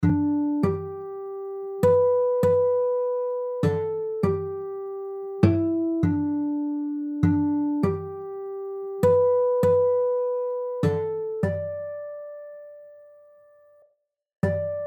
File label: Amazing Grace in Harmonics, guitar; Amazing Grace using Harmonics